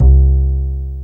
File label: A-BASS